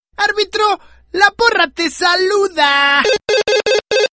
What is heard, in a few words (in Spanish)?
arbitro